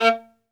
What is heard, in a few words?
Synclavier Strings, SOLO VI; ILIO